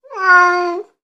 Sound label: Cat Domestic Meow Sad